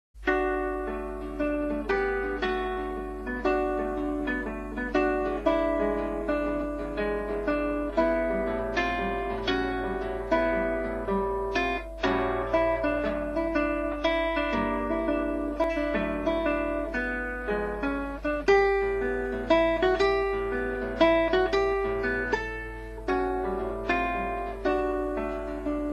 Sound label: Guitar rap; Jolly Guitar